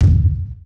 Claymore Mine Detonation; Weapons & Firearms; Sony Pictures Sound Effects Series Volumes 1-10; Sony Creative Software

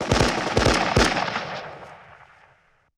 Machine Gun Multiple Short; Weapons & Firearms; Sony Pictures Sound Effects Series Volumes 1-10; Sony Creative Software

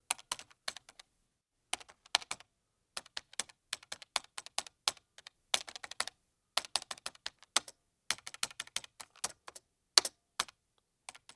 Computer Type Slow; Home & Office; Sony Pictures Sound Effects Series Volumes 1-10; Sony Creative Software

